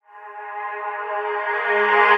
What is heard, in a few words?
vocal engage Abm; SD AURORA Sample Pack; Slate